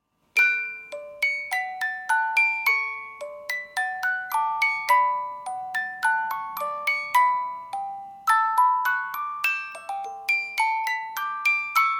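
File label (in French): chanson populaire française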